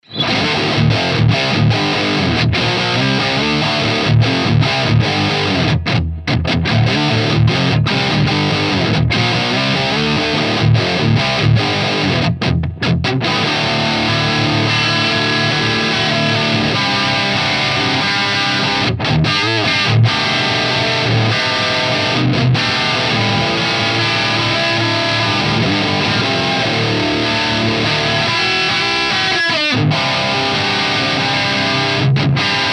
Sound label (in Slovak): Roadster Diody Modern 4 kanal